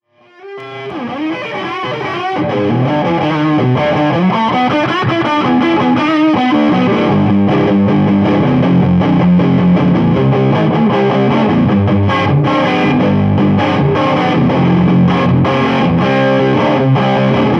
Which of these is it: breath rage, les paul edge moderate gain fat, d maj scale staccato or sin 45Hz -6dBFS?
les paul edge moderate gain fat